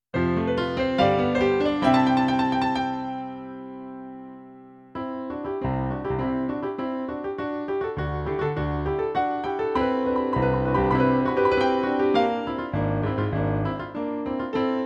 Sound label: piano only